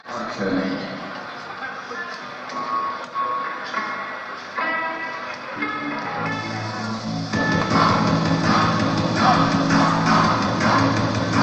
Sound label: Audience Recording